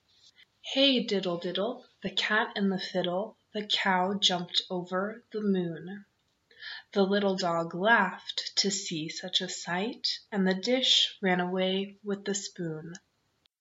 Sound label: hey diddle diddle, Nursery rhymes